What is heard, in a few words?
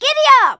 Ambient